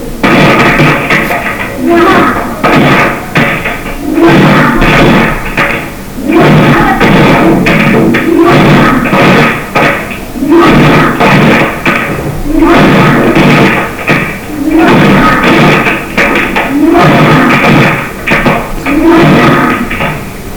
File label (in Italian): ritmo ternario